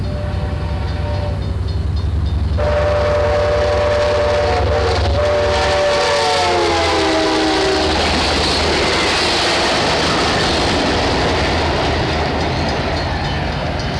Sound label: 79 mph, trains; eastbayrails